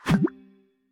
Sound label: Obstacle Macaron Remove; In Obstacle Macaron Remove